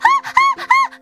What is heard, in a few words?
polka